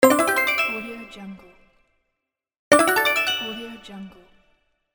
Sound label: small successful; game sounds; موفقیت کوچک